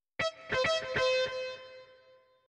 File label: SMS Distortion